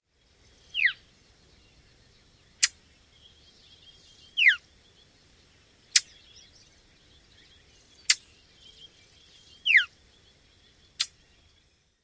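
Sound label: Brown Thrasher tee yoo and smack calls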